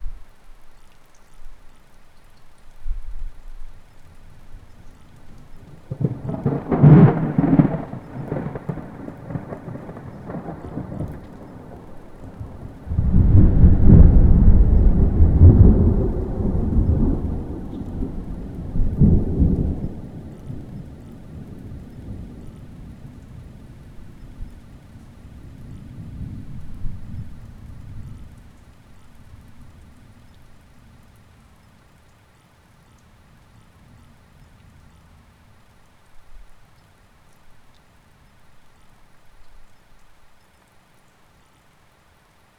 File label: thunder over an open field